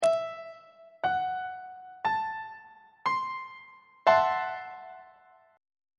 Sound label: g flat half diminished 7th 3rd inversion on treble clef